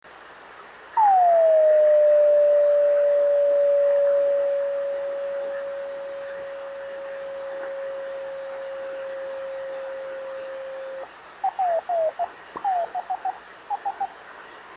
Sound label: 26940KHz